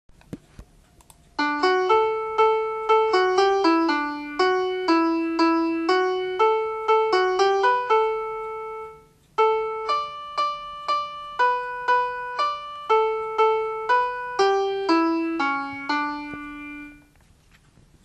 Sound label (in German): Klavier